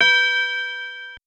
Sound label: Bell B5